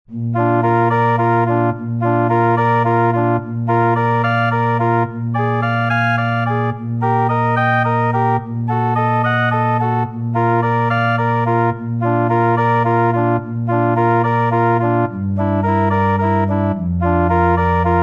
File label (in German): Querfloete Solo + Orgel-Playback; Ave Maria